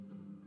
Spring

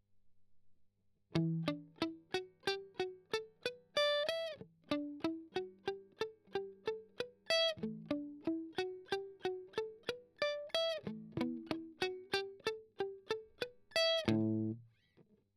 Strum Octav Arp

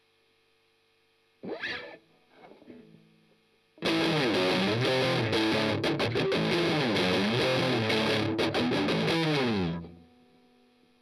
Strum Octav

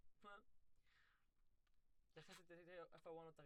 Sax; Gefell

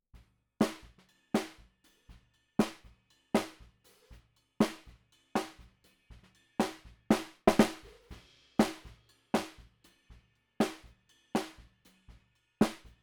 SNR Bot

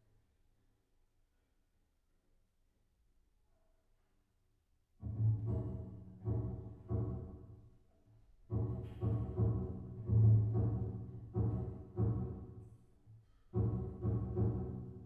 Bass

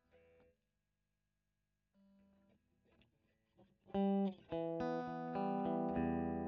E. GTR Royer

